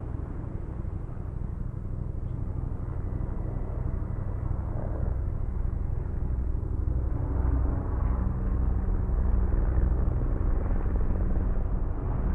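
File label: Cobra Heli Fly By Right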